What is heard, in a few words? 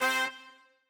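trumpet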